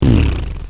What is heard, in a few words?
raspb